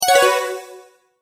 Multimedia Tone-Return/Back

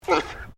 ANIMAL ALPACA MALE GRUMBLE; Alpacas on a farm in Gippsland Victoria; Miscellaneous Animal; SoundEffects-Foundation1

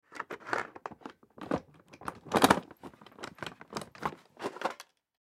High Chair Remove, High Chair Remove Tray; Household

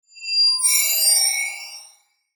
Logo Bright Shimmer Swell; Sonic Logo Sound Effects; Production Element Imaging Element Accent Transition

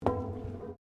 Footsteps Boots Metal Spiral Stairs Steps; Footstep Foley; Human

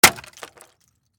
Impact Automobile Taillight, Smash, Shatter; Crashes; BlastWave

